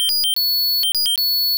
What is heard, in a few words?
Alarm, 8bit; first generation iPod sounds; i OS